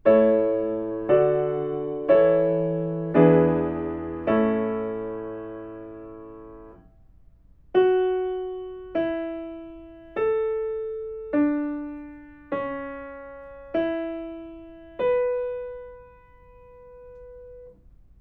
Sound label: melodic fragments